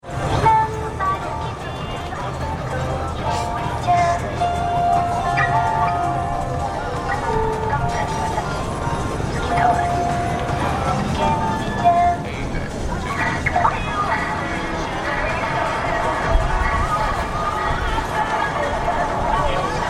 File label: Shinjuku Stn; JAPAN